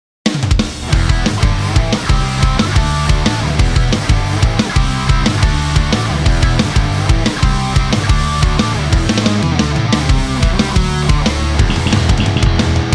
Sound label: Rock 180